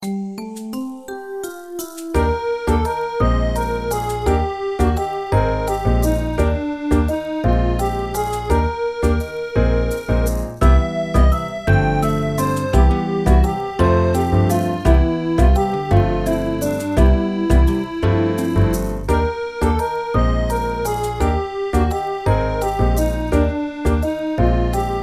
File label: Lounge; animal crossing amiibo festival